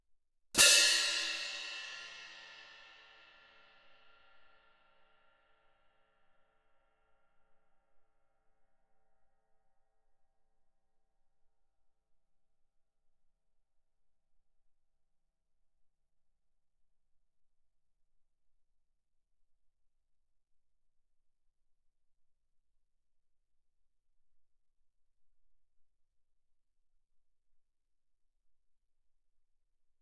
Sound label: 15 orch crash, ff, crash cymbals; bandaturka; Percussion